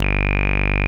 MOOG HI G0, ANALOG BASS; Music Store - Claudius Diemeter Schwerlos

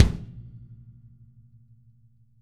kick

